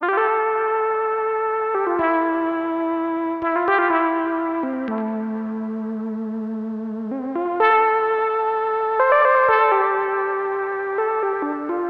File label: LEAD